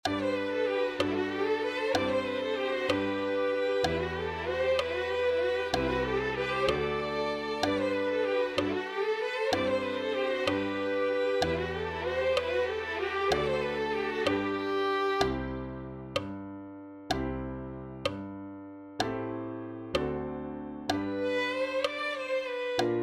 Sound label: Violin; On Vstal Z Mrtvych